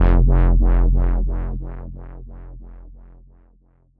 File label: growling bass hit distorted and aggressive